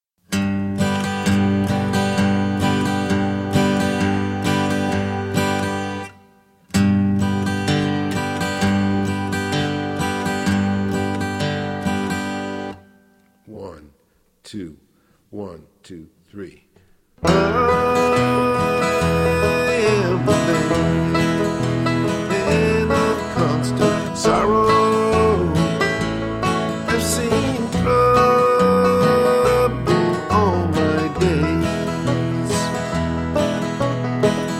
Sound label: folk guitar